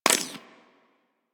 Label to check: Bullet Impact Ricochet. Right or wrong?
Right